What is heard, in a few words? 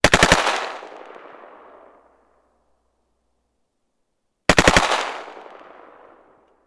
gun ak 47; still north media